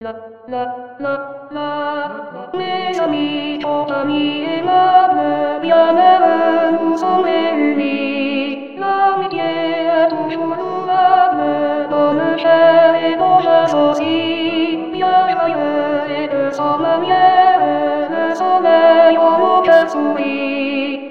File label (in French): retrouvailles alto